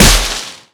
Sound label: Tree SFX KO